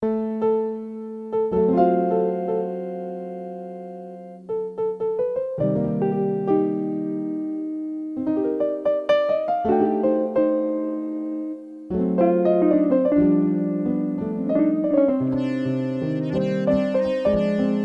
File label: Jazz Sad Memories